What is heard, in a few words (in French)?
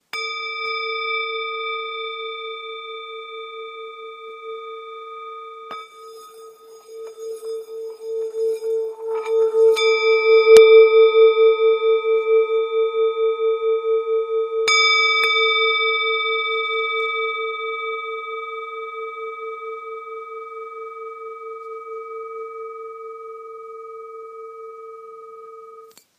bol bengale 2-300G LA